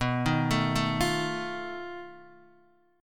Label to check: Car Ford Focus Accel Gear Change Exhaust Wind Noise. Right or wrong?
Wrong